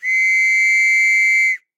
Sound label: trench whistle; civ13; civ14; Items